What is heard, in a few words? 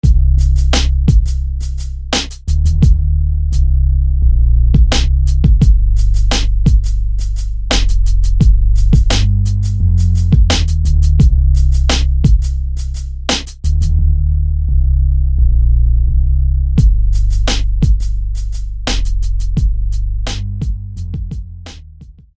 Red Bass; SFB FX